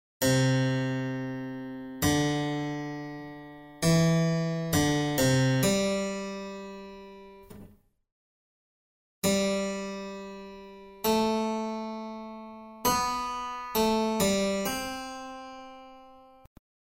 Tema e risposta

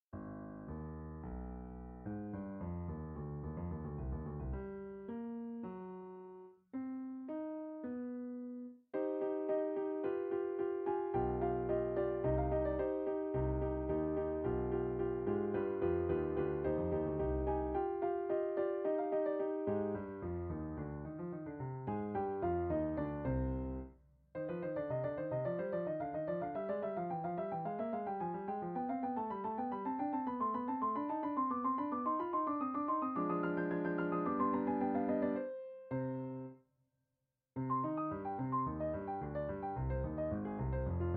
Abbozzi per un terzo movimento Rondo di sonata per pianoforte

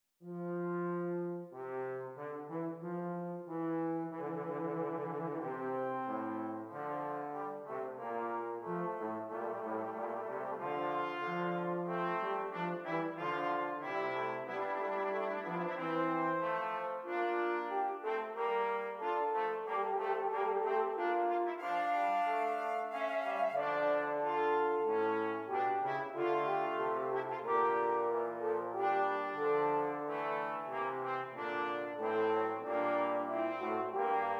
Fuga in fa maggiore; Hess 243; seconda versione; Nottebohm numero 2

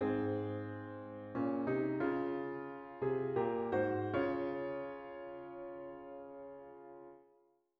Frammento per pianoforte; versione Graziano Denini

